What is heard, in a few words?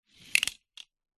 leisure toy cars x2 drive crash into each other; hotwheels